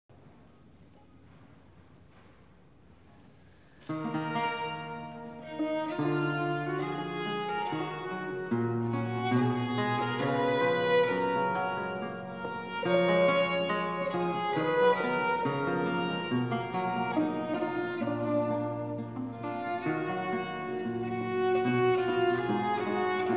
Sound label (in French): Deux violons et theorbe; FALCONIERI; La suave melodia